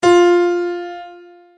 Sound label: F4 piano; notes